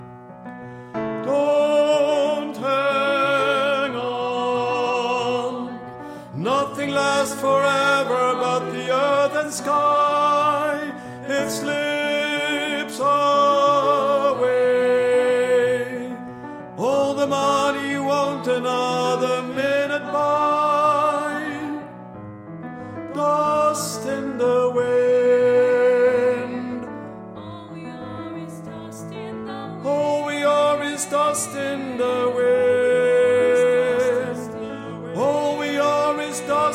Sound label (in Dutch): Tenor en lage alt